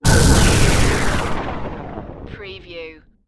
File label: SYNTHESIZED EXPLOSION